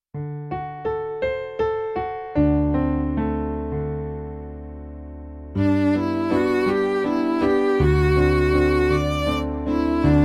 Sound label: violin viola